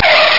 oil; chase

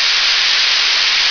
Steam; environ; Gunlok

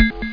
bong

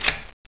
Taxi; Win3d; Venus

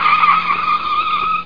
Screech; davidson

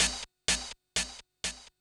DRUM SHOT HOUSE 125; eJay; Singleshots

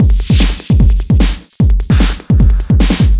Tribal(150), Beats

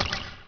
flak

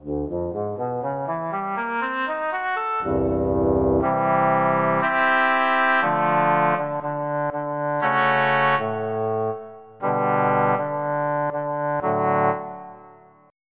Oboe; EverQuest

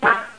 Plant Attack; Curse of Dragor

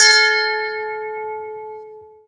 bells

